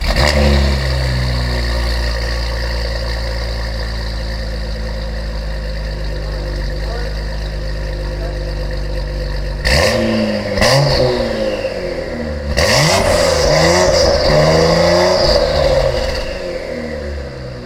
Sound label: tapout downpipes